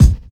studio kick sample c key

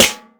snappy urban acoustic snare f key; snare-drum-samples

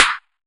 piercing snare drum sound c key

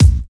raw steel kick drum C key

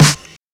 Airy Sizzle Snare One Shot D Key; snare drum samples

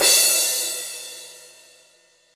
long crash single shot c sharp key

